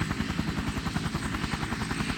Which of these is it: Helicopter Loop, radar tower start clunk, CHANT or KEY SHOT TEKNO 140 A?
Helicopter Loop